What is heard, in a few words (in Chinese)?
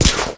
狼甲受损声